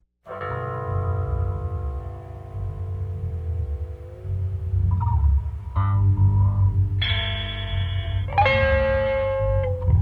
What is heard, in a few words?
Gtr Bass; Knitting Factory Works